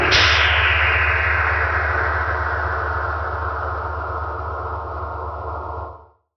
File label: Sci-Fi, Classic SFX, cartoon; musicradar; cartoon caper